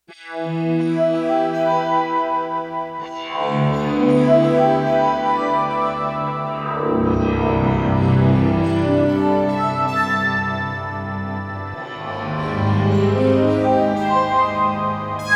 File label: Distant Piano; Digital Synthesizer